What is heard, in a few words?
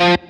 Staccato